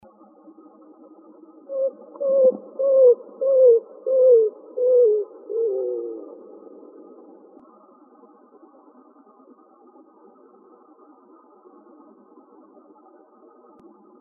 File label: Cuckoos